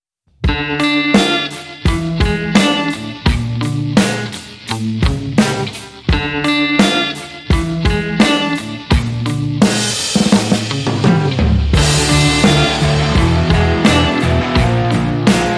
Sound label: No Synths Mix